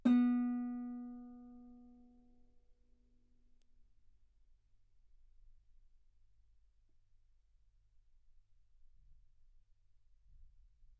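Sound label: Guitar ff B string B3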